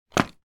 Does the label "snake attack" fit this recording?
No